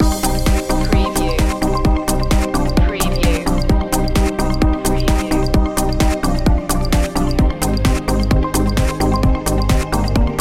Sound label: loop